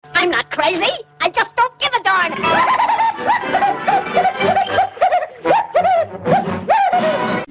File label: laugh